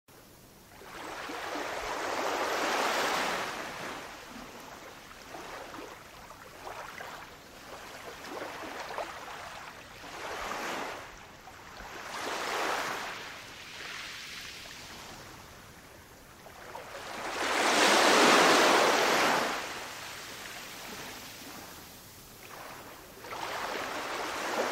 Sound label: sea